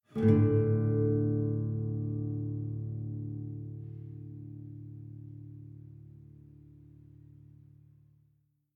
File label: Savannah Music